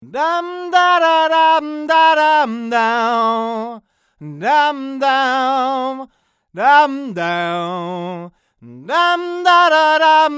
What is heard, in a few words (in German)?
nah; MA301fet